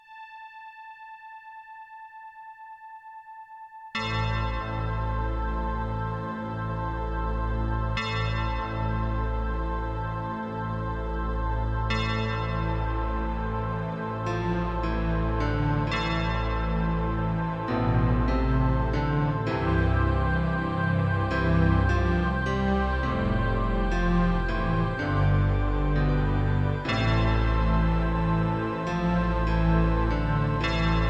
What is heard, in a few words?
Midiplus MiniEngine USB; Gabriel Knight 1